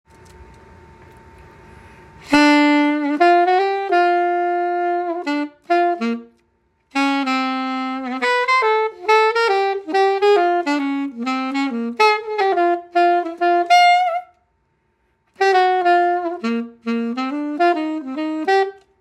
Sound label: Jazz Alto Sax